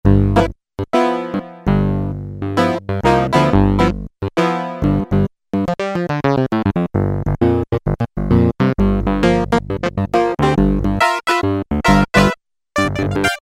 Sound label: Fuzz Clav, Kawai K1m; Factory patches - I banks